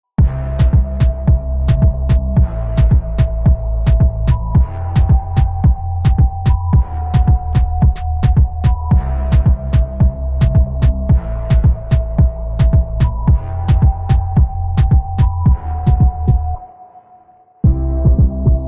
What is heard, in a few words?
Instrumental